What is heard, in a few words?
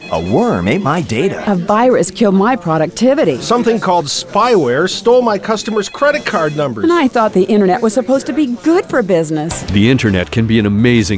on hold security